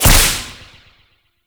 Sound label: crack impact; Warframe; Khora